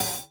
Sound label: hat, open hat